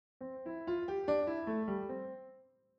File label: Piano